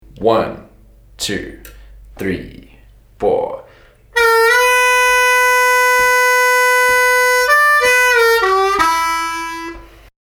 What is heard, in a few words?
Lick